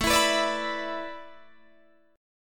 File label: A Suspended 2nd Suspended 4th, Guitar, Open D